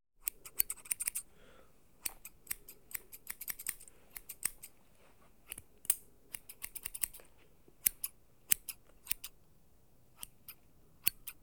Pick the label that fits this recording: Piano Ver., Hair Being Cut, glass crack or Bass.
Hair Being Cut